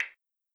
hit sound; osu

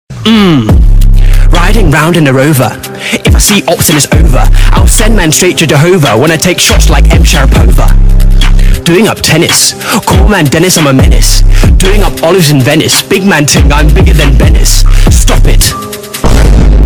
british rap

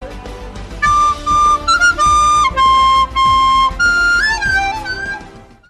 f1 flute theme

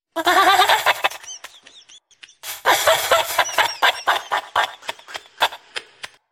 baby laughing; aldeano

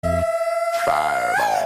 pitbull scream

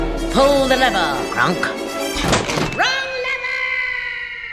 lever

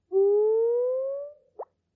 sleepy; animal crossing

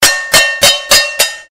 roll

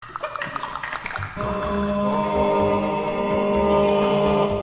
singing; ugandan knuckles

